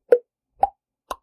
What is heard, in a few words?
Pop